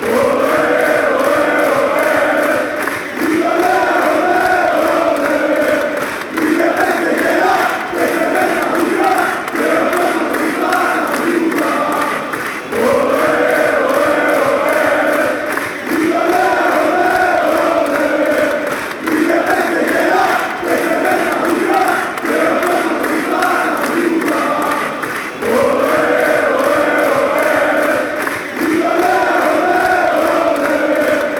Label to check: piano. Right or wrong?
Wrong